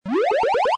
gamble win; American Poker The Legends II

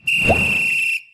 text appear; Fishing For Gold

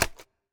click press; Hungry Shark; Wazdan

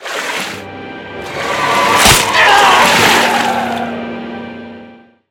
gambling lose; Hungry Shark; Wazdan

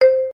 winline; Beauty Fairy

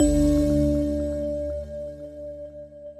prize bonus; Great Book Of Magic Deluxe; Wazdan

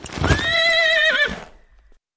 jokey jump prepare to move; Racetrack Riches; megaboard

